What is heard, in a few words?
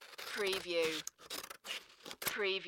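Scissors Cut Construction; Sound Effects Bible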